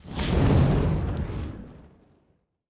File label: Weapon Shoot (Flamethrower); Sci-Fi Sounds